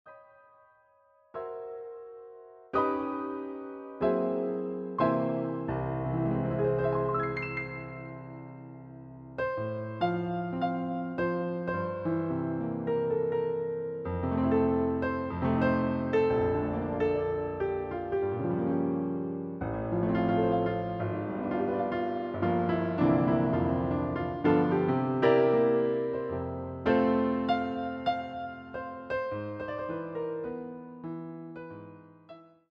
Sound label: piano roll